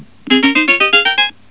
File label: bonus